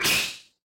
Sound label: snake attack